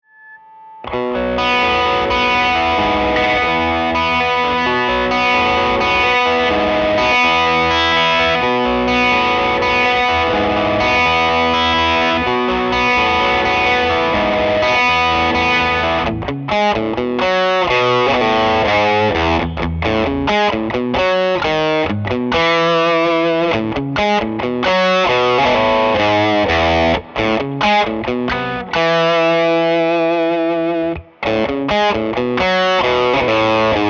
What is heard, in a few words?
lp boost with lots of gain; Ikon